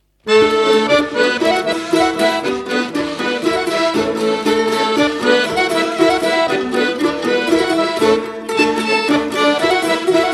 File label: bashkir tanes